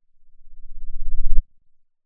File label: Sound of a lion’s roar